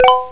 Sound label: bonus